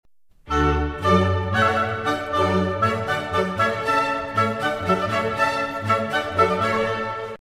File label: Half cadences